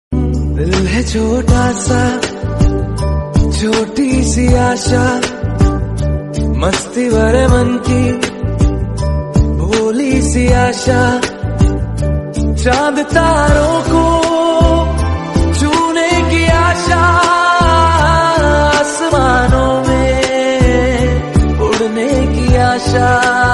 Song Hindi

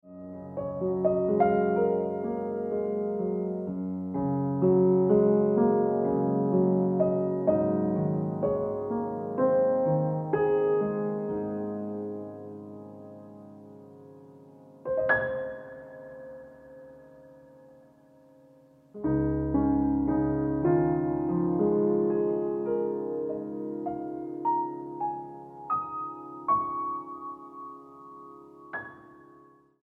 Ringtone Piano Music

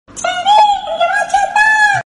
Notification

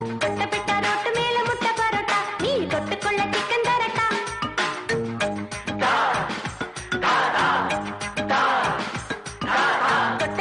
Tamil Song Ringtone

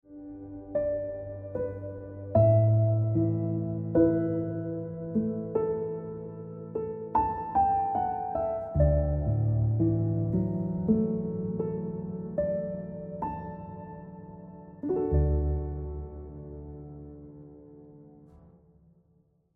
Piano Music

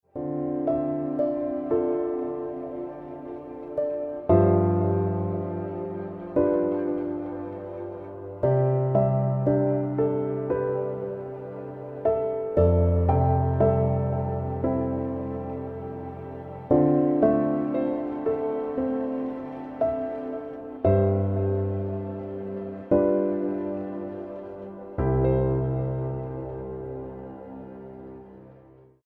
Ringtone Piano Music

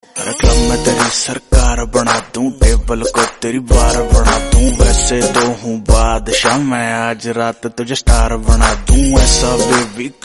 Hindi Song, ringtone

